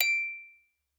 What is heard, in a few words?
musicbox